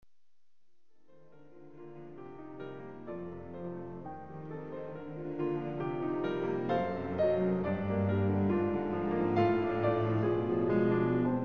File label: Piano Concerto